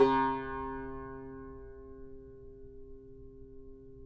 CUMBUS C, Guitars

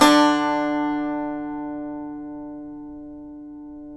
SAZ; Spectrasonics; Hans Zimmer Guitars VOL-2

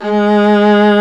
ARCO VIOLA, Solo String; E-MU Producer Series Vol. 2; More Studio Essentials; Composer; Solo String Combo

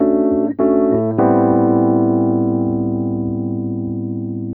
E.PIANO, KEYS

